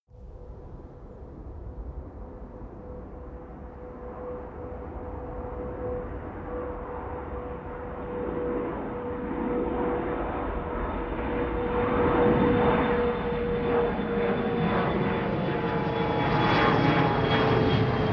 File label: plane flyby land; flugzeuge verkehrsflugzeuge; verkehr transport